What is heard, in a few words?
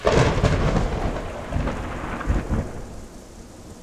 air attack; propack